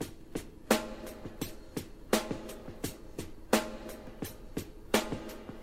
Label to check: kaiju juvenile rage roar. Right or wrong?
Wrong